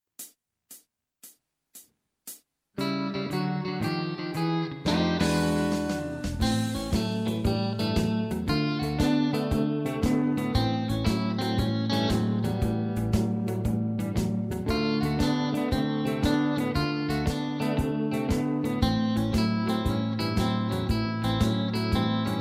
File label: Togheter in blues 115 BPM